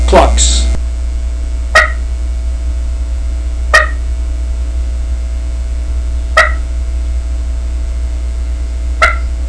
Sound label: keekee clucks, turkey; mt laurel; Southland Sounds